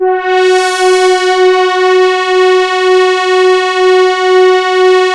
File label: MONO PAD; PADS